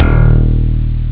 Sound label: slap bass; robocop; ST-XX Amiga Soundtracker Sample Packs